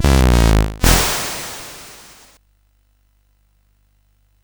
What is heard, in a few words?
Pipe Mania, CSG8580R5